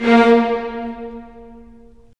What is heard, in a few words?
STR, Roland